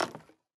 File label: Wood Furniture Impact Soft